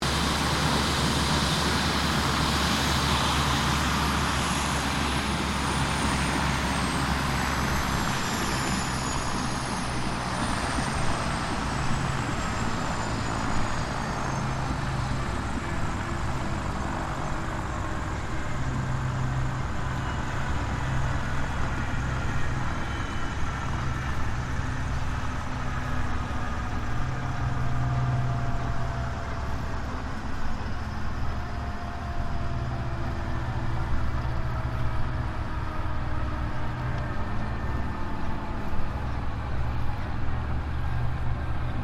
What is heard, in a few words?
HELICOPTER 1990 SIKORSKY S76 RESCUE SHUTDOWN; Aircraft; Raaf; Helicopter Sound Effects